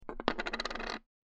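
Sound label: sounds of playing checkers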